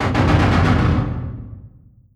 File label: distance mechanized units mg fire; Hearts of Iron IV Death or Dishonor